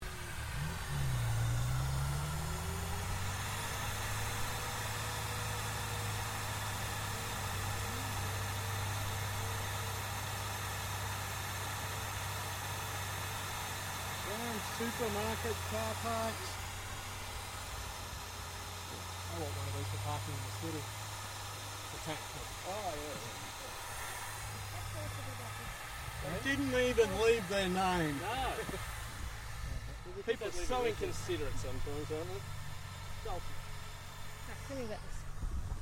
TANK CENTURION CRUSHED CAR, British Centurian main battle tank; Trucks (Sound Effects:Vehicles); SoundEffects-Foundation4